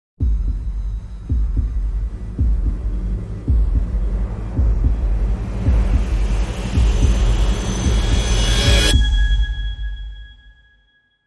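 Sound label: Dramatic Sting, No Orchestra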